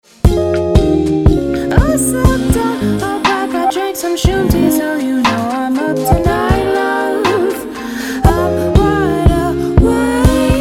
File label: POP